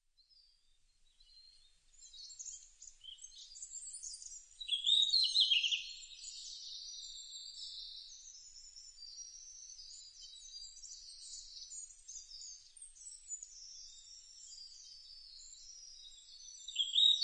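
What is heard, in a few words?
ruribitaki, red-flanked bluetail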